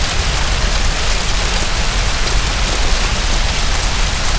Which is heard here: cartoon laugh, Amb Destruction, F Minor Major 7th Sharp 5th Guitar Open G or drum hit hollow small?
Amb Destruction